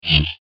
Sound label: Laser Sword Strike, light saber, science fiction sound effect; star wars / trek style games; Andrew Fleming